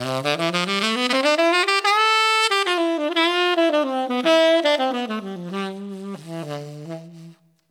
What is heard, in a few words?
Up And Down The Scale 16beat Dmaj 110